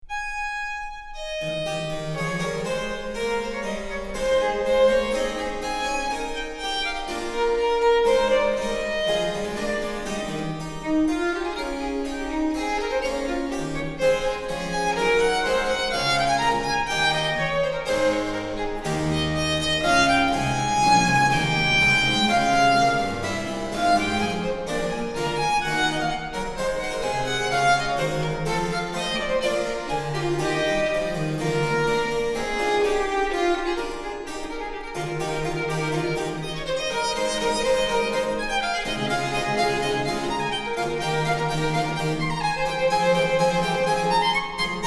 Sonata in A major for violin and continuo, Allegro; HWV 361; c. 1730